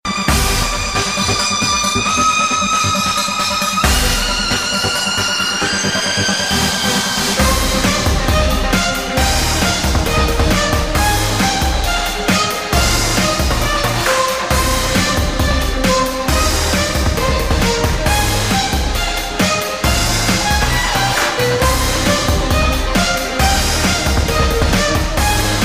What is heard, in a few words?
sensation violin